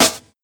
snare sample E key, snare single hit tuned to the E note, Loudest frequency: 4293Hz; snare drum samples; drum single hits one shots